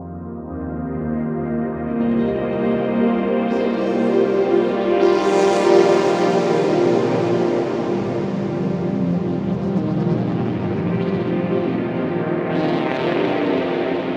Downtown Overlay Drone

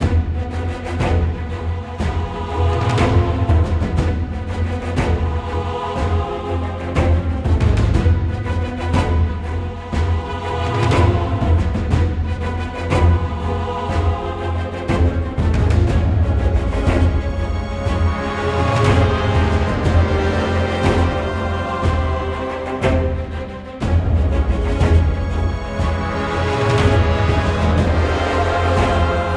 music combat loop

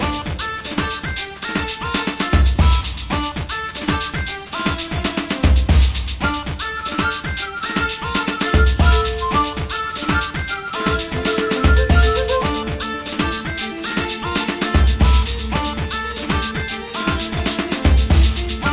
BGM; Adventure Player; psp

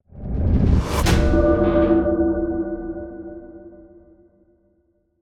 Unlock Ghost Level; Towaga; Android

